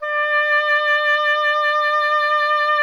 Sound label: oboe D; Miroslav Vitous; Solo Instruments VOL-2